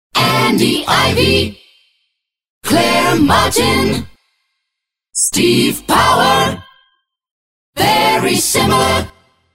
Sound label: DJ Acapellas